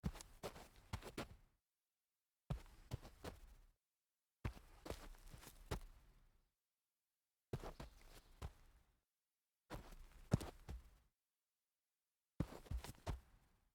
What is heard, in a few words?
walk turn dirt, foley